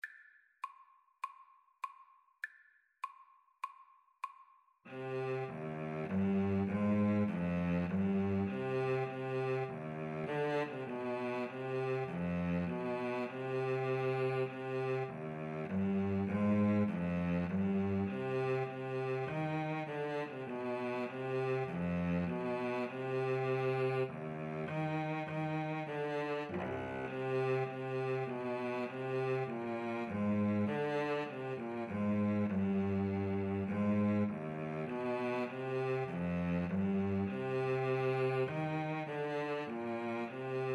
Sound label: deep love, Flute Cello Duet